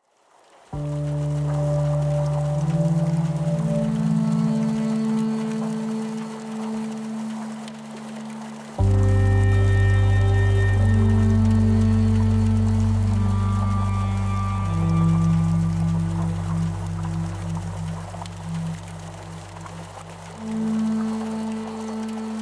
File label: Skysounds